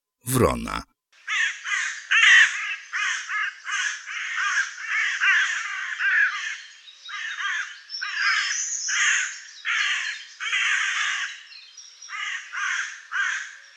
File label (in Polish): WRONA